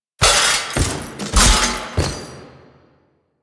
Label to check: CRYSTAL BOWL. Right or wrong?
Wrong